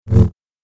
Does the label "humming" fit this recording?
No